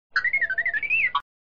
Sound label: Star Wars R2-D2